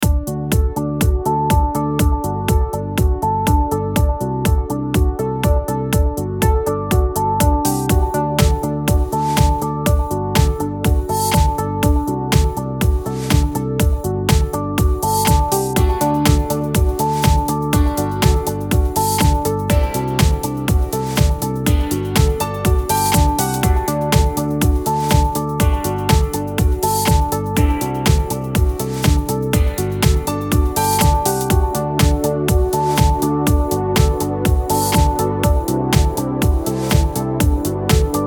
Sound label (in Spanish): corporativo inspiracional